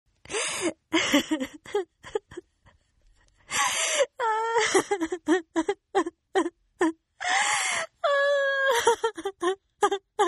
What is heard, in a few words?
Crying Female; Voice